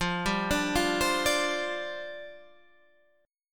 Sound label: F 6th Suspended 2nd F, chord